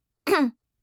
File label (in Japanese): sekibarai; serifu others